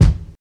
good kick drum sound c sharp key

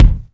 original bass drum single hit c sharp key

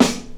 boom steel snare drum sound a sharp key

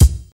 acoustic kick drum single shot c key

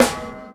verby snare single hit E key; snare drum samples

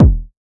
smooth kickdrum sample e key; kick drum samples

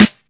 puffy snare sound g sharp key; snare drum one shots

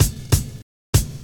103 bpm drum loop a key; drum loops; kick loops

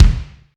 mid range steel kick drum d sharp key

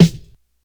verby snare drum sound E key

